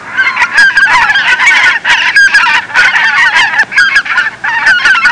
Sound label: Seagull flock sound